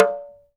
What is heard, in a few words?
TALK DRM, Heart of Africa; Spectrasonics